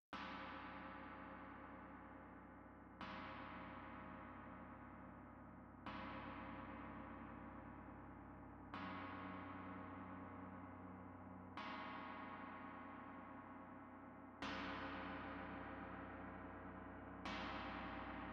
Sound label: Tam-Tam Front